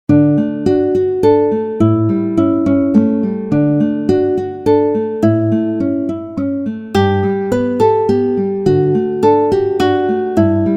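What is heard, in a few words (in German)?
Dat du min Leevsten büst, Gitarre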